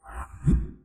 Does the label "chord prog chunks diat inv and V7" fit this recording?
No